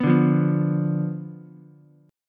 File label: B Diminished B down Ukulele; Bdim strummed